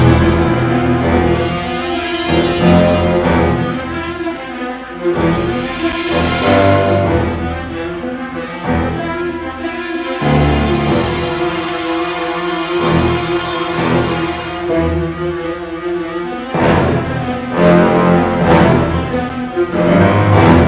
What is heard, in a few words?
fear theme